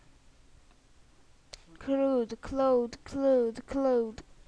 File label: crowd